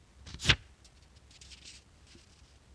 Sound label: Postit